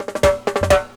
SD FILL 120, drum; BREAKS

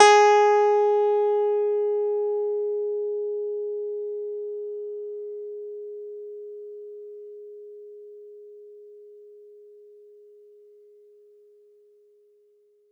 Clavi, Digital Keys

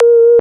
BEEP; ELECTRIC-SET

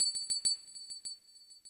FX Industrial 140

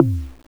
BONGO; KillerTweaksII; DirectWave

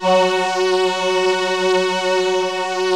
VP330

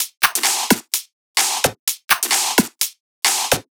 tops 128; Anthemic.Electro; tops loops

